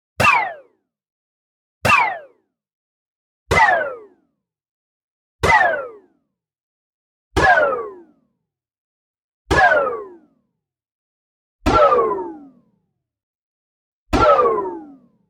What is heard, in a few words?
Ricochet Metal; Cgeffex